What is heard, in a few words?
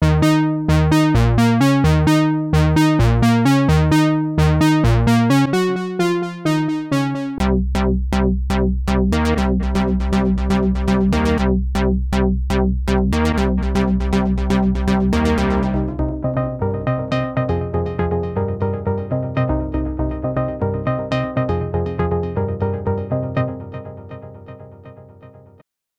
leads pioneer toraiz as-1